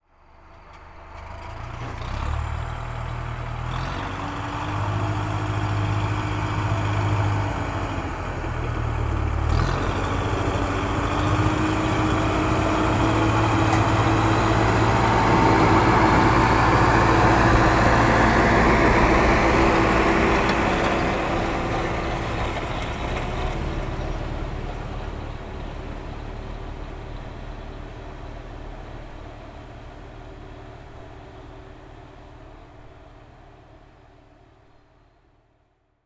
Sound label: tractor pass by; transportation